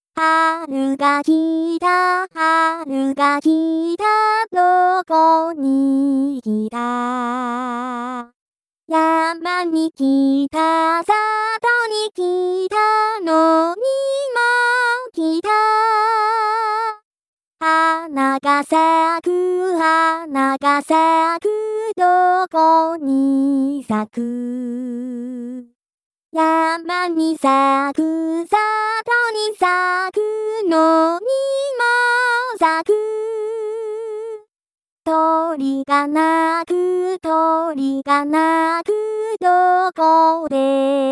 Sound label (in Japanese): harugakita voice